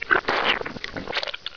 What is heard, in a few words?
die; CHASM